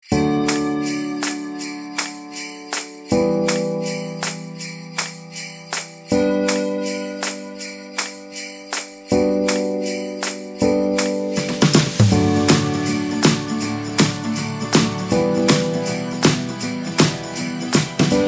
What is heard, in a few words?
christmas village groove